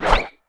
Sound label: crit attack; LoL; urf rider corki